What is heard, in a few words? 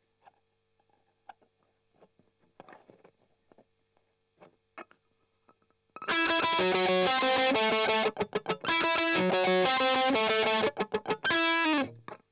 Strum Octav